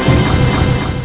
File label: thud